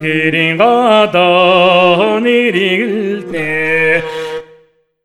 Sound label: MONGOL V, Asian Traditions